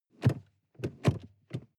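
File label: sports car interior seat handling short; verkehr transport; autos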